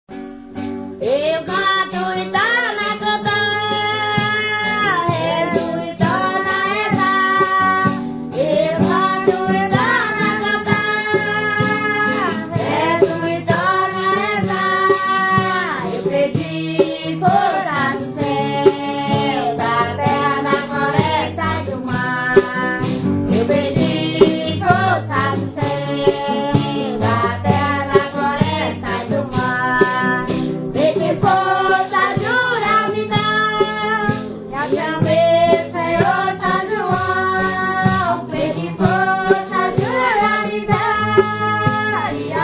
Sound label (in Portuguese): Canto E Torno A Cantar; Hinos do Santo Daime